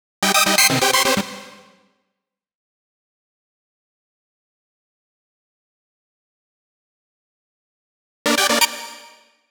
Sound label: 127 Gm Arp, Complex Electro Synths; Prime Loops; Shoxx